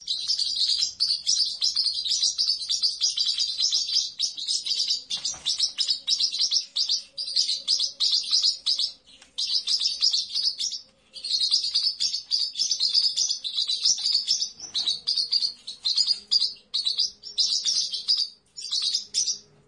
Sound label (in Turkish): forpus papagani sesi